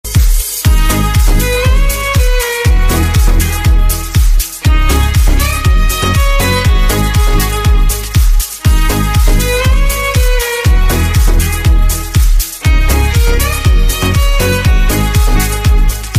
violin

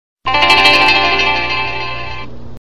Token loud, ringtone

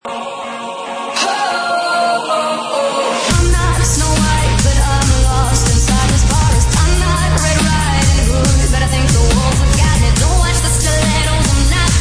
dubstep remix

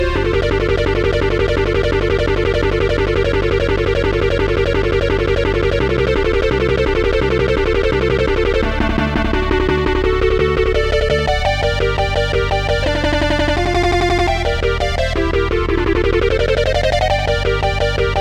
Metal Bit

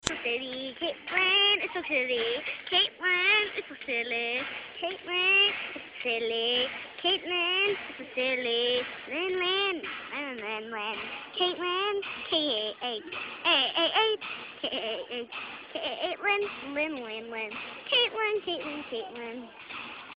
starts to sing

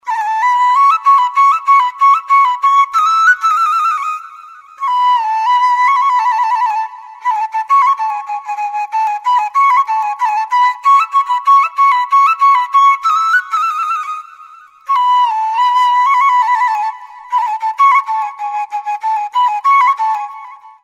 best tone ever

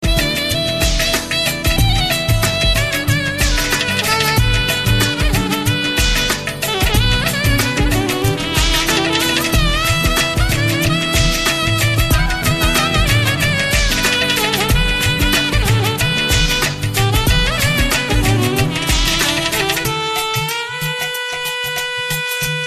vst ringtone

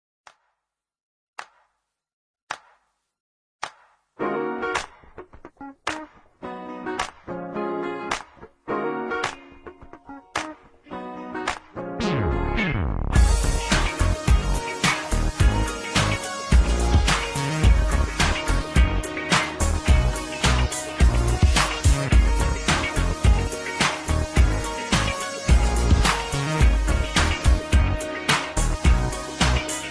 gfunk instrumental

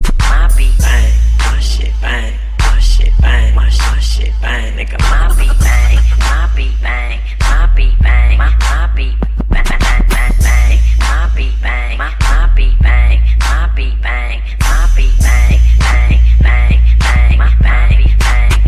short beat bang, hip hop

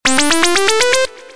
doremi

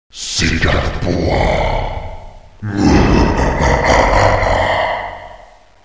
Evil Laughs

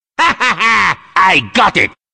laugh, sms; ringtones; wario

